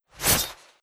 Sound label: melee jump; custom weapon; k31; octane